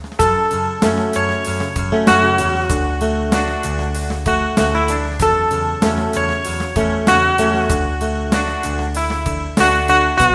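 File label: electric guitar clean smooth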